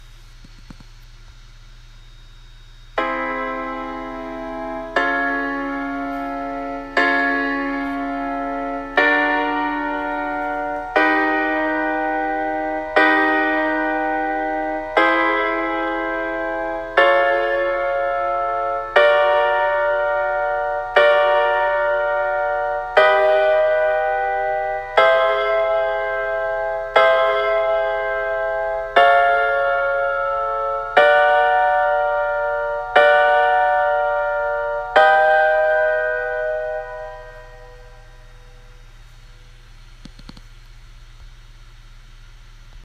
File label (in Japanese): オーグメンテッドコードの音